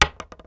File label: wood; Mafie 1